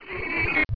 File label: squeel red eye alien; prayingmantis